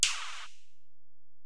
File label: EPerc, Alesis DM-PRO